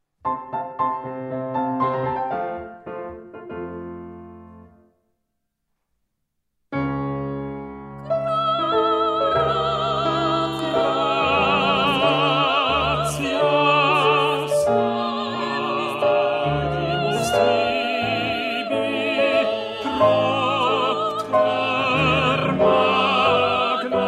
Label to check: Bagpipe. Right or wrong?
Wrong